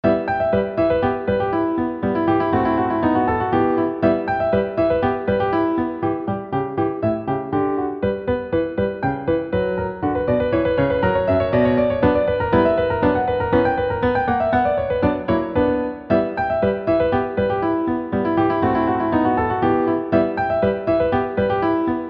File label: Badinerie, Orchestral Suite Nº2 in B minor; Bach J.S.; BWV 1067